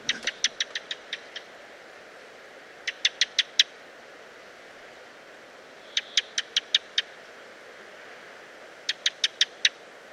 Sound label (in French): merle a plastron, orn